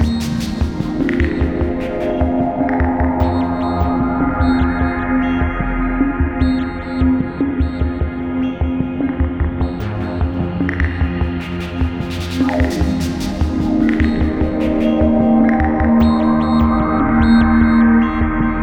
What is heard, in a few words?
reflection F 150bpm